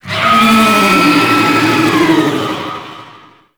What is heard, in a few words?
Pain; Warframe; Tau; Sentient Dactolyst